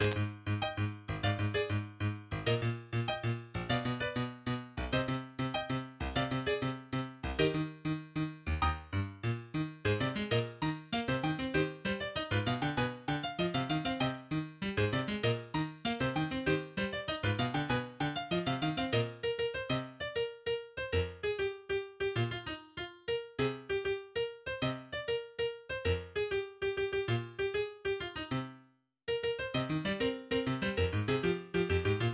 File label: Piano only